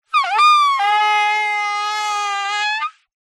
leisure inflated rubber pool toy rub ground squeak